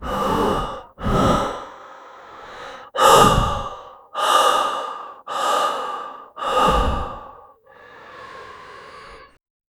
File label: demon breathing